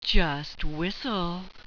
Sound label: just whistle, sultry